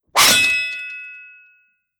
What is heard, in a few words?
Sword Scrape; Coat Of Arms